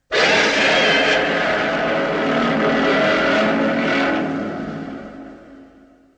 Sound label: Varan, Gorosaurus and Baragon Roar; Showa